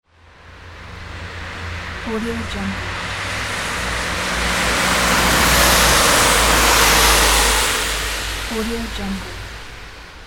car passing by on wet road

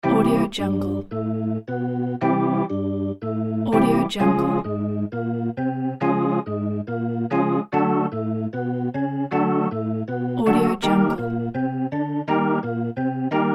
rousing sports arena organ chant

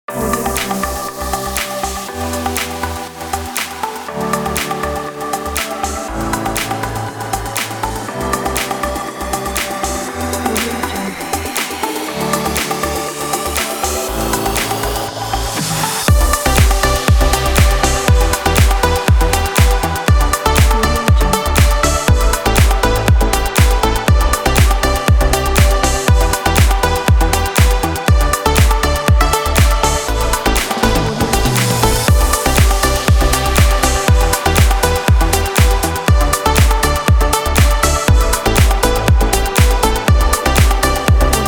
summer fun; blue-sounds

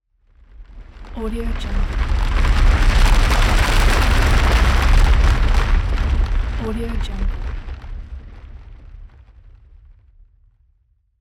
rocks sliding

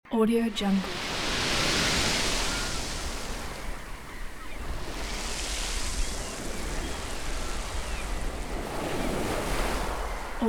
beach waves and seagulls

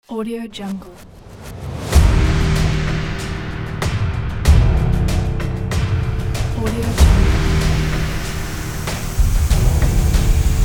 suspense logo

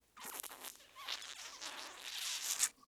wet sucking; prosoundfx

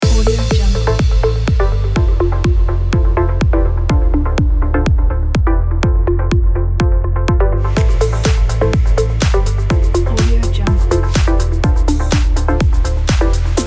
fitness fashion; bluewhalemusic

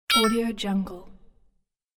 security system confirm beep

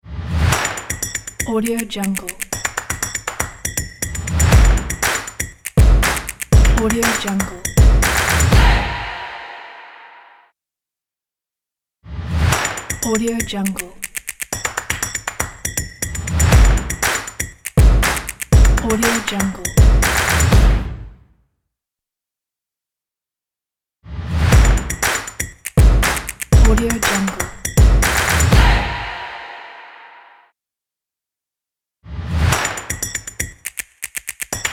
percussion logo

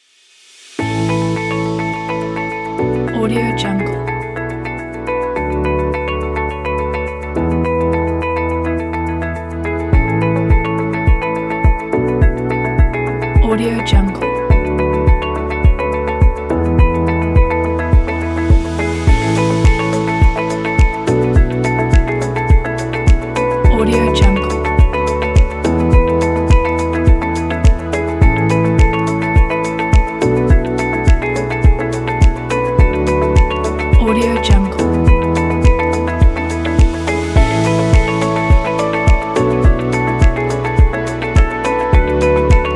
chill

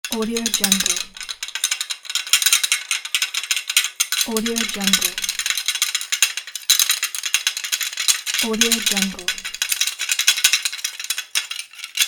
shaking glass

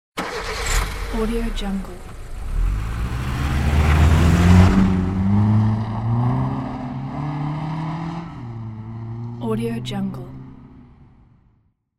car start

